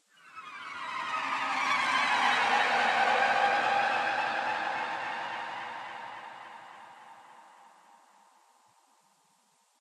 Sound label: Combat String Start